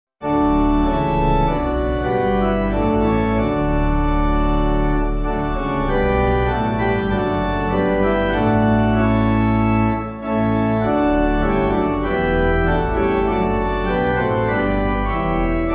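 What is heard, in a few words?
Pipe Organ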